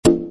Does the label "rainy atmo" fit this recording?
No